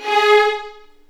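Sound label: G#4, The Ultimate String Collection, violins marcato; East West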